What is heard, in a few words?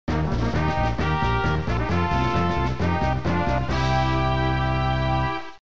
Victory Song